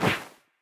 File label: Character Move; helltaker